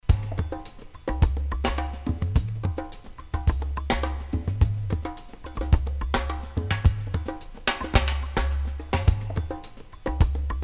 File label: Rhythm Groove